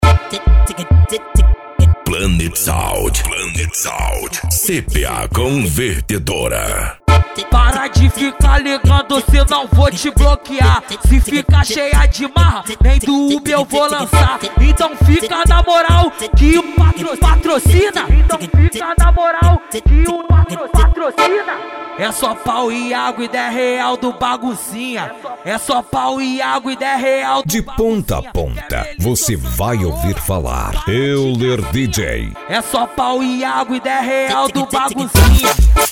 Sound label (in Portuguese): funk ritmado; cuiaba mt